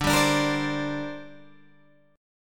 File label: D 7th Suspended 4th Sharp 5th, Guitar, Open G